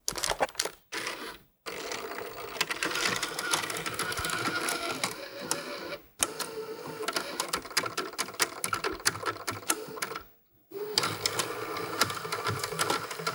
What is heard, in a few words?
Broken VHS Camcorder Playback; SFX; IRL Recorded